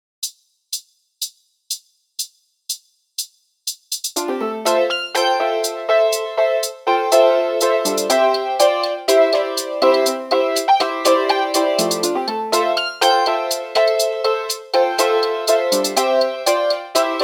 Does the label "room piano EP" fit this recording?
Yes